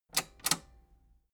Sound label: metal button robust spring click disable slow